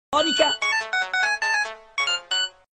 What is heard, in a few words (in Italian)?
Suoneria polifonica